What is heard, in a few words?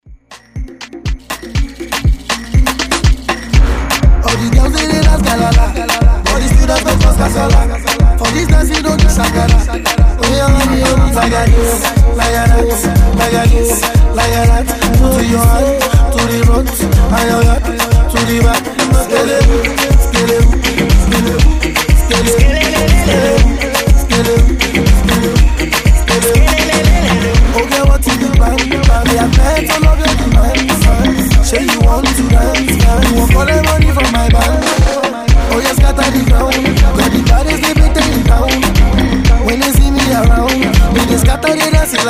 Afrobeat Redrum